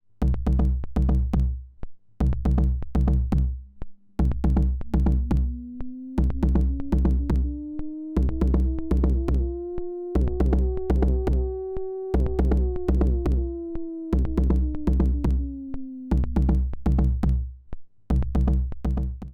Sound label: spring filter Q; Snazzy FX; the eternal spring filter